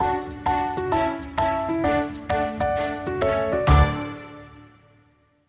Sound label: Bumper